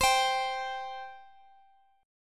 C 5th, C, down, Guitar, Half Step Down

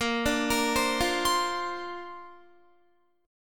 Bb Major Add 9th, Guitar; 18,17,20,17,17,19; 4E,4A,5D,5G,5C,6F; arpeggiated

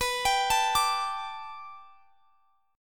B Minor 7th Sharp 5th B, chord; arpeggiated; x,x,21,24,22,22

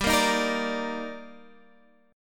D sharp Major 7th G

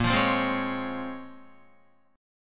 Bb Minor 9th Bb, Guitar; Bbm9; strummed; JGuitar

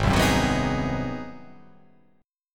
G sharp 7th Sharp 9th

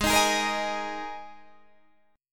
Gsharp Suspended 2nd; x,x,18,20,20,17,17,17

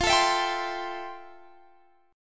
F Major 9th Guitar DADGAD

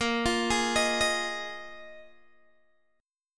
A sharp 7th Suspended 4th A sharp, Guitar Open G

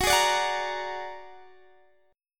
A sharp Augmented 7th, chord

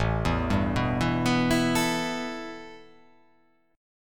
A 7th Suspended 2nd

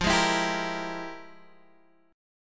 F Minor Major 11th Guitar Drop D, chord

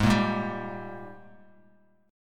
Ab Major 7th Suspended 2nd Ab down Bass Standard; strummed

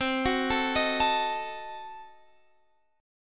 C Diminished 7th C, Guitar Open F; Cdim7 arpeggiated

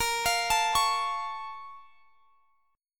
Bb Minor Major 7th, Guitar, Standard; BbmM7; arpeggiated; x,x,20,22,22,21; JGuitar

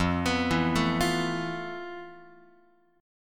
F Major 7th Flat 5th, Guitar; arpeggiated

